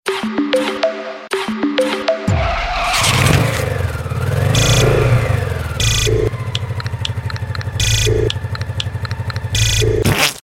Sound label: Bike Techno